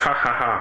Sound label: "Ha ha ha"